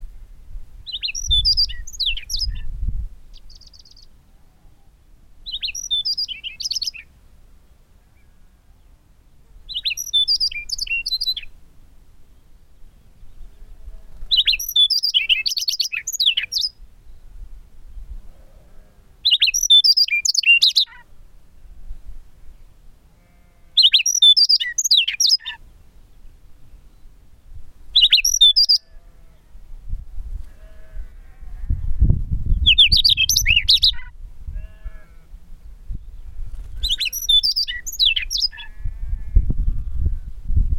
rubythroat chinese Calliope tschebaiewi

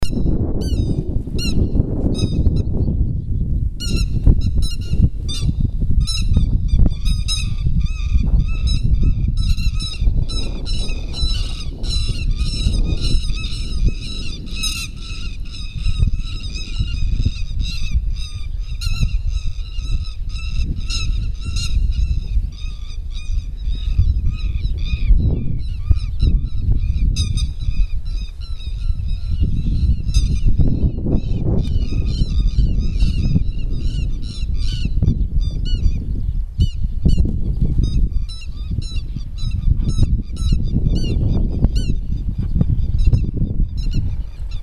lapwing grey headed Vanellus cinereus

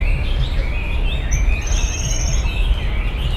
warbler pale legged leaf Phylloscopus tenellipes

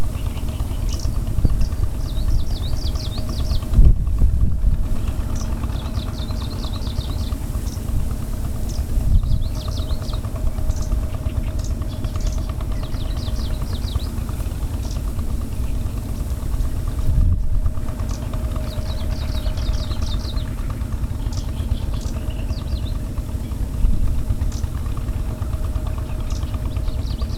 warbler kamchatka leaf Phylloscopus examinandus

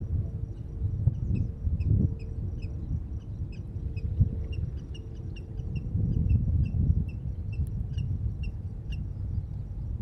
rail brown-cheeked Rallus indicus

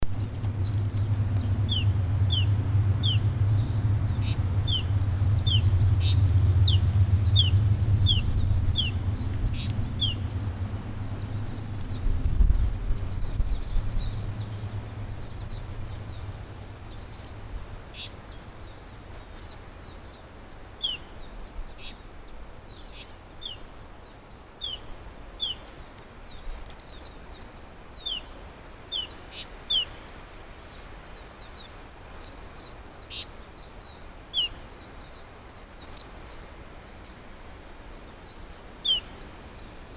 warbler eastern crowned Phylloscopus coronatus

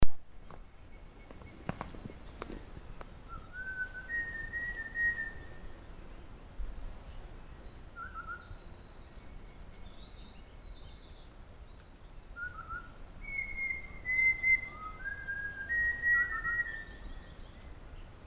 laughingthrush buffy Garrulax berthemyi

